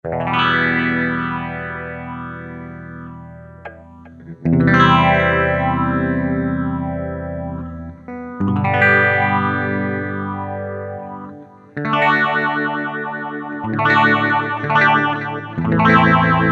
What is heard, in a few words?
VOX PHASE, Digitech RP200; Factory Presets